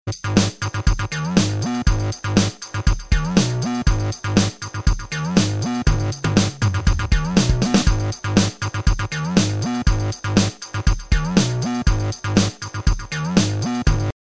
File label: Techno Style